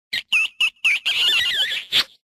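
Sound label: cartoon kiss sound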